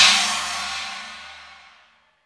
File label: TIP SHANK, CYMBALS; 90 s Sample CDs; Back In Time Records; Big Bang